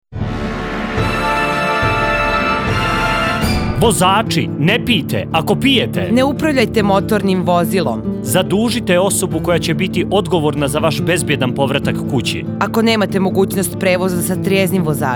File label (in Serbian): Radio džing